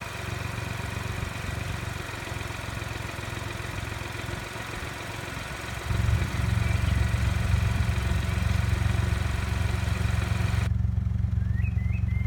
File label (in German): Mini Clubman F54 3 Zylinder Auspuffsound